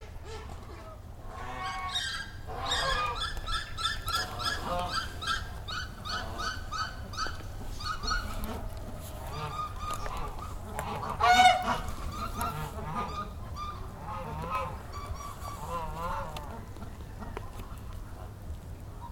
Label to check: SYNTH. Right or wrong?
Wrong